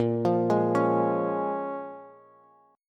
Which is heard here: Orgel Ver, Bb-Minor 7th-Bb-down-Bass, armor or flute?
Bb-Minor 7th-Bb-down-Bass